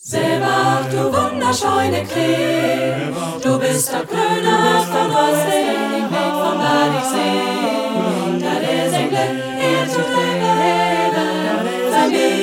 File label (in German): Gemischter Chor Acapella